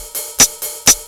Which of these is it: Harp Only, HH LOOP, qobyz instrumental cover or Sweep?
HH LOOP